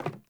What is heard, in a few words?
Sandals Run Wood, Footsteps; Characters; Player